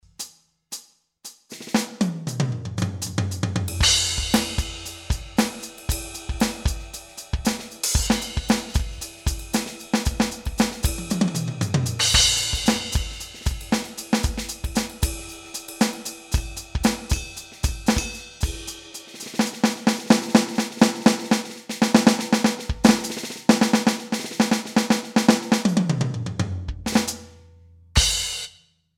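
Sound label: Alesis Crimson II Kit Pop, drums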